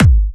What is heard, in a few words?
BD Trancy; Club; VEC1